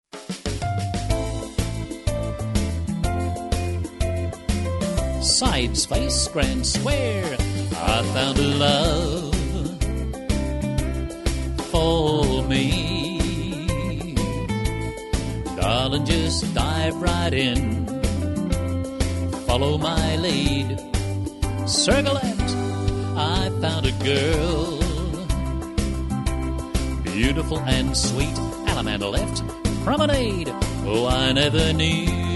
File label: perfect vocal, square dance music